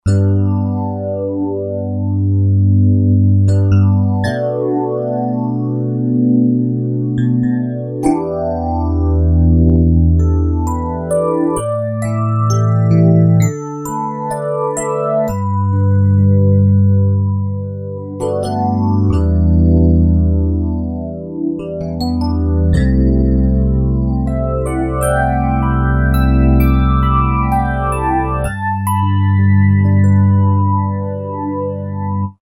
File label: MK-80 Phaser; Roland JV-2080